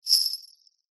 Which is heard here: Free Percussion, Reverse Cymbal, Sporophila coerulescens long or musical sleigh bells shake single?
musical sleigh bells shake single